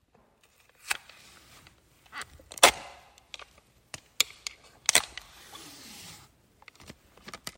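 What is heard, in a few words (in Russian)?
дверь в кабинете закрывается на защелку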